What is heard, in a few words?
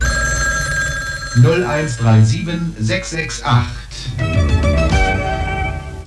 jingle; swf3 hitline